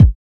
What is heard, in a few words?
warm bass drum one shot a sharp key, Royality free kickdrum sample tuned to the A# note.